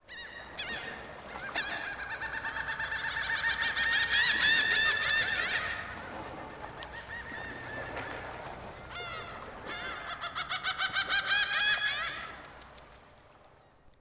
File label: Sea Gull; Ambient; Civ4 warlords